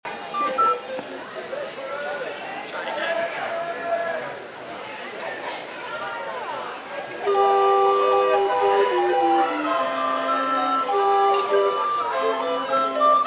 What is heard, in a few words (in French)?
flutes a bec